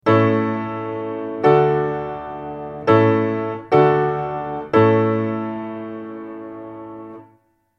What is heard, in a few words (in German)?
akkordfolgen